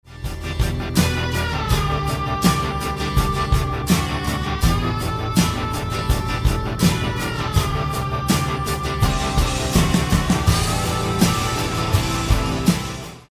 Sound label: Rock Music Song Loop